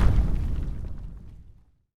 Destroy Boulder

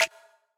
Menu Click

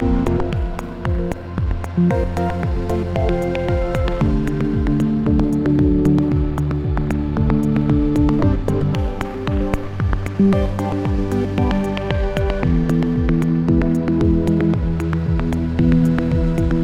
Loading BGM; Astro Boy Edge of Time

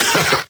Vocal Adlib Male Laugh Short; bass house; fx vocals

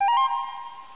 bonus taken; 101-in-1 Explosive Megamix; WiiWare

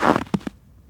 Step Snow

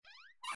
Cabinet Open

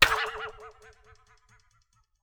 Web Stuck

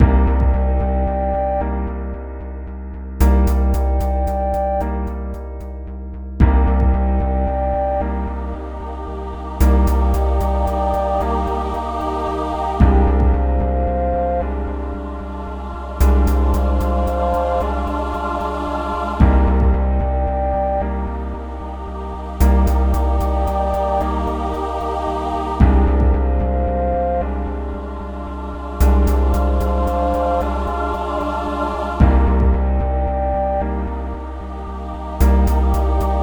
Dungeon

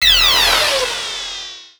Challenge Failed; uno

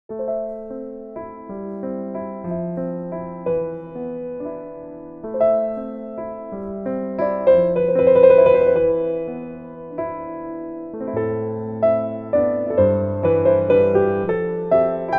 Piano Version; Infinity Nikki; Infinity Nikki Game Original Soundtracks Vol.13

